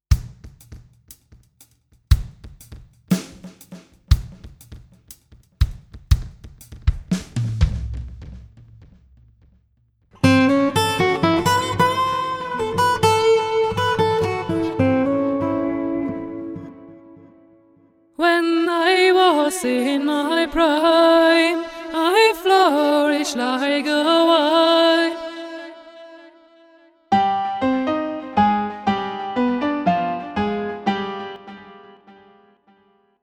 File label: RMX16 Echo